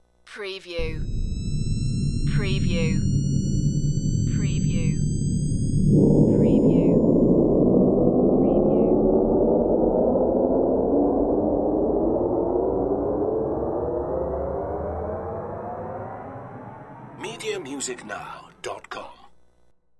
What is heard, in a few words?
Jet Engine